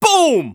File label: Scout taunt exert